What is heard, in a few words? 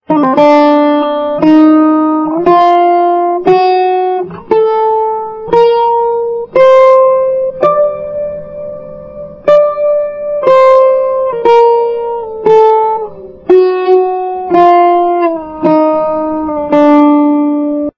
maqam saba D; zamzam